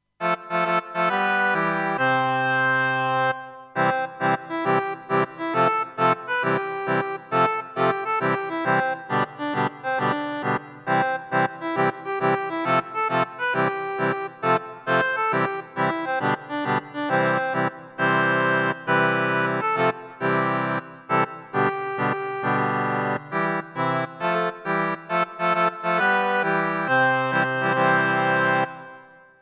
backing; 学校計画

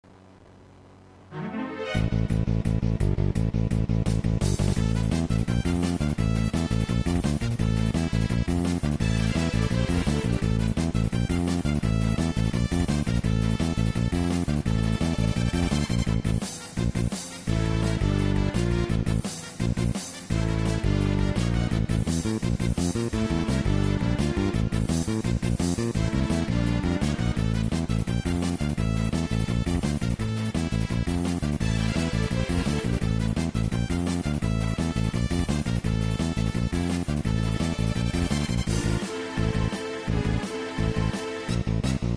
battle

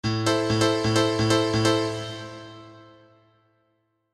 piano

